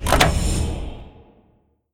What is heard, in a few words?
colored lever, tardis; p00gie